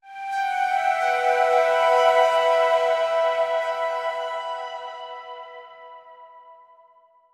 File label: Synth Emin